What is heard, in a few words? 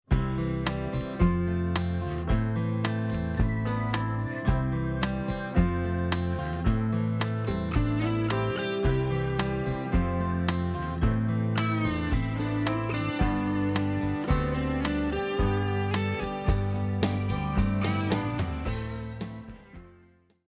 country